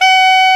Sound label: SAX A. MP, SAX Alto Short